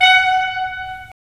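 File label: wind clarinet; LA Composer vol. 3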